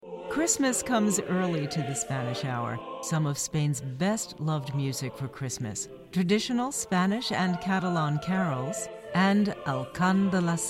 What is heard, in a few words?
spanish and catalan traditional christmas music